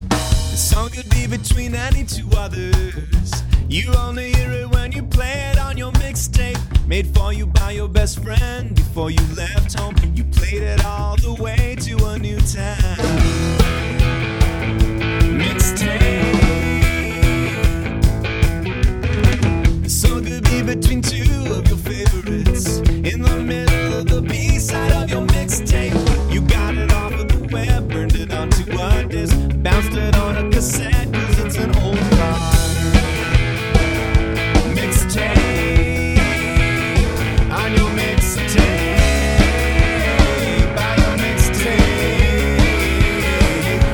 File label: backing